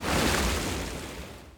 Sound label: damage hits explosions, Warships